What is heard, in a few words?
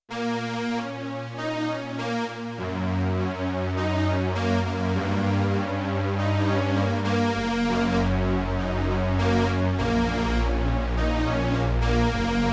Shifting Image, Analog Strings; Yamaha TX16W